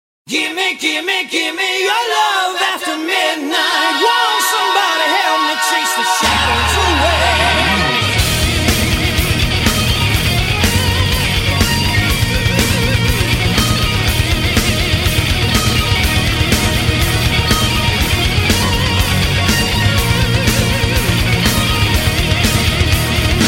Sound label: Metal Cover